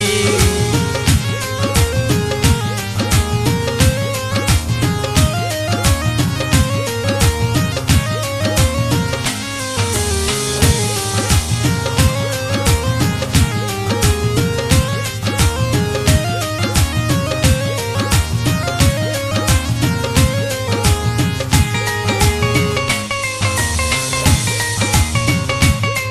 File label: rajasthani romantic